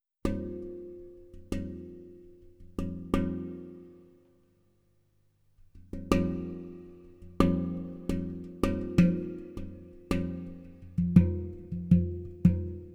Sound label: Hang, Skin Udu, Alto Flute